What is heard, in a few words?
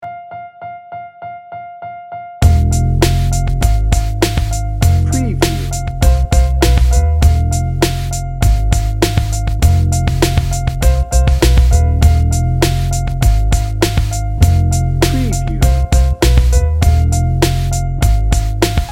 sneaky suspicion no horns